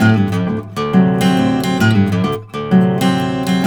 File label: BARI RIFF, Blues Guitar; ILIO; Fretworks